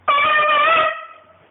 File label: whip